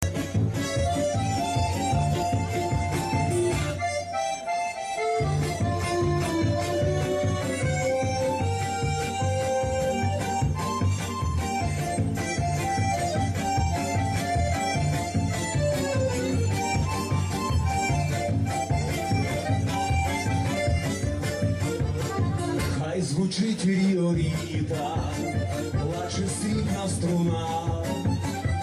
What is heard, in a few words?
Ukrainian chanson